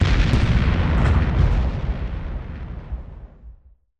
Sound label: explosion